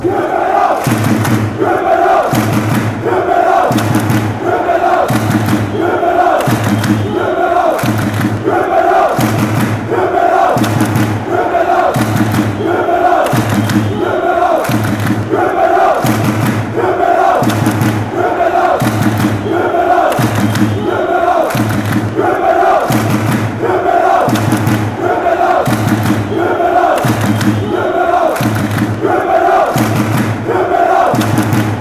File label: copenhagen drums, fanchants